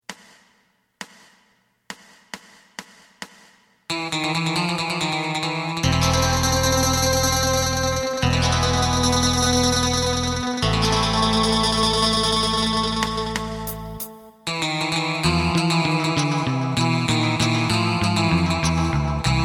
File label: drum